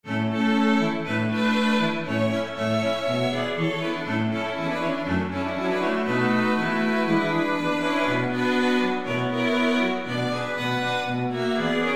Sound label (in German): streichquintett